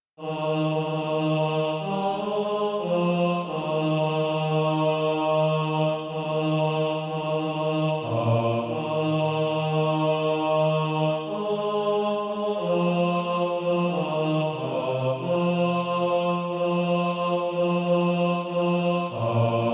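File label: bass, SATB Practise Music; Christ the Lord Is King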